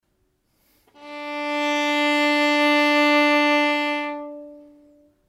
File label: viola